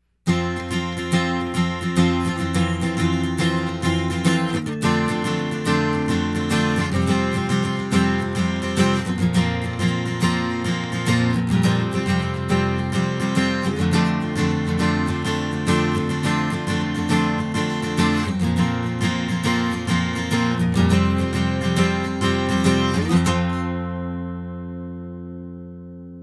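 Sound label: Focusrite CM25 MkIII acoustic guitar; focusrite scarlett 2i2 studio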